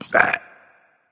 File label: tongue, voice; Smite; ymir skin14